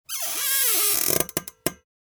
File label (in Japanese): zonbi